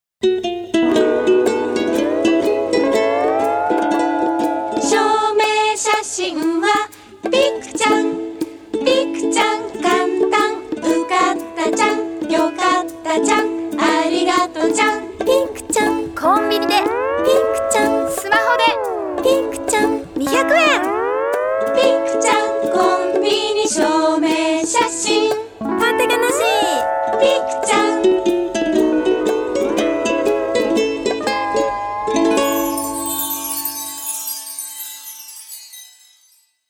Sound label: ukulele song